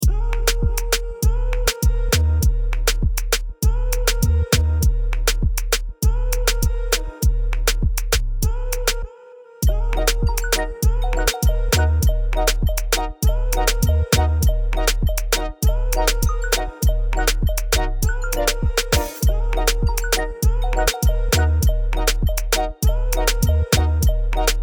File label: Dancehall